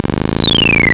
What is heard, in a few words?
Apple II FX Tear Drop, Chiptune